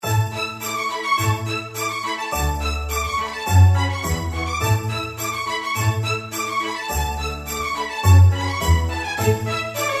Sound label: Christmas Ball; SafeMusicList